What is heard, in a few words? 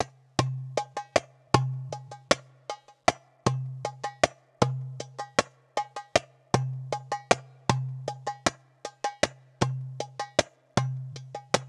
4 measures 4 rhythms